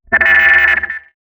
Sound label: pickup misc